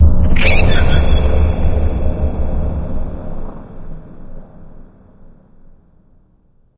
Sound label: advisor blast; video games; ambient; source engine; portal2; outland